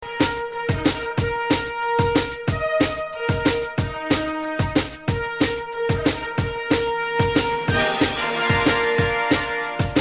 Techno; Superman Techno

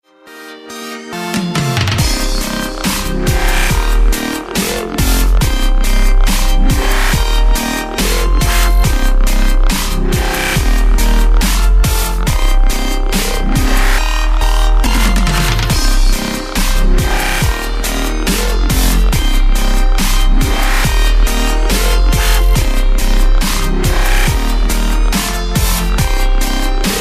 Dubstep

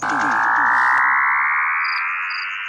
Oddtone

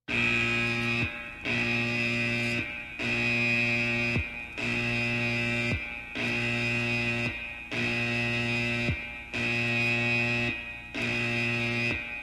Navy Alarm

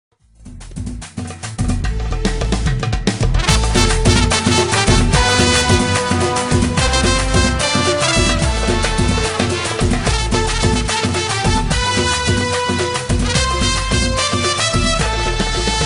Trumpet

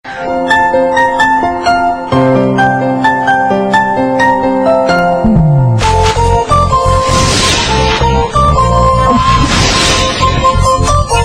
Instrumental

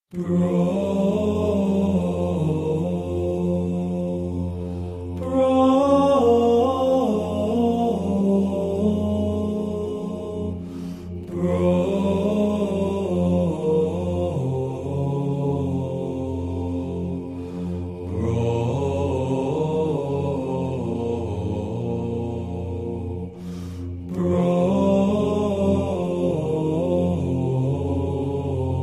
Chant

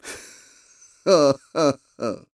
Laughing; Ghirahim

